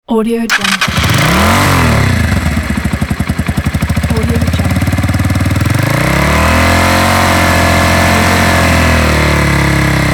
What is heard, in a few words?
motorcycle sound